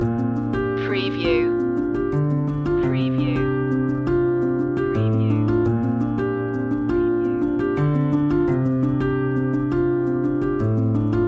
right track loop

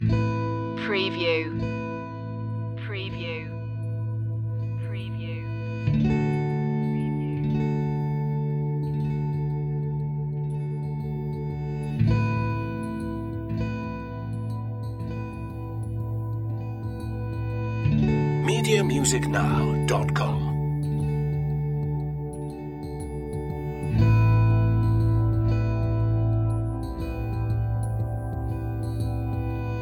a sad moment

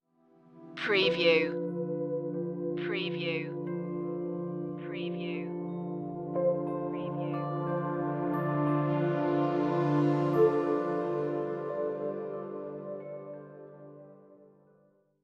breath

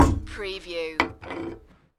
Metal Tl Bang Wd

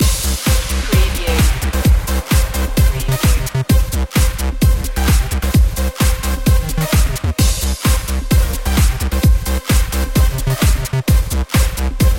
party rave anthem

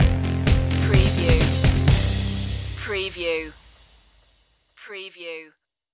lexicon stinger

